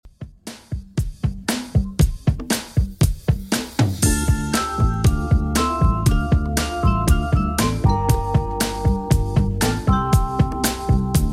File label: 70s Redrum 118 bpm CLEAN